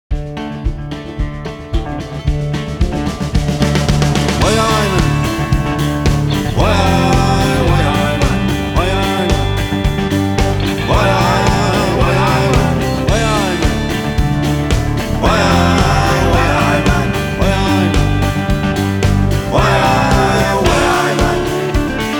Electric Guitar

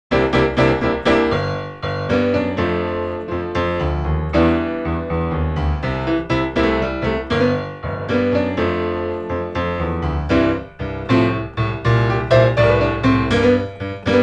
Piano

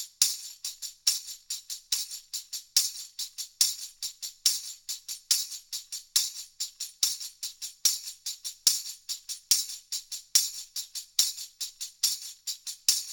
Tamourine R-121

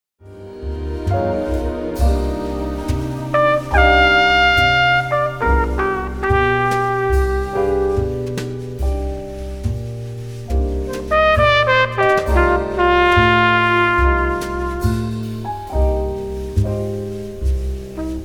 Brass

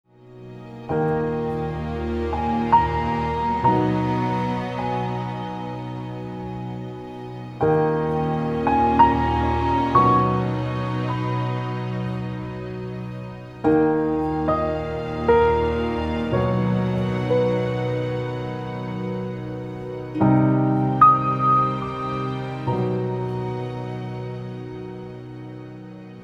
Strings

